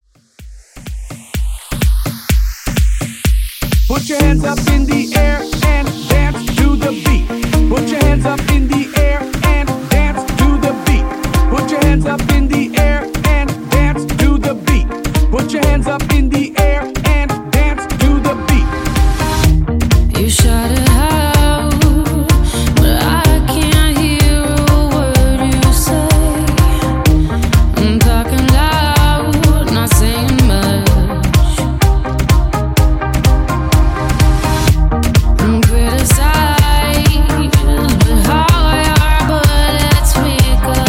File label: Throwback Redrum Hype 126 Bpm Clean